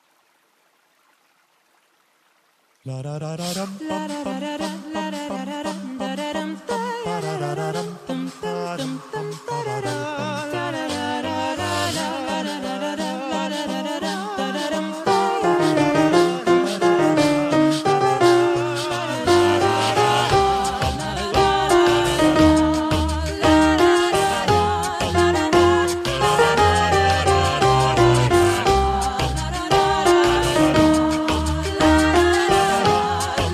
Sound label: Carol of the bells